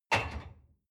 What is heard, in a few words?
dishwasher cup place soft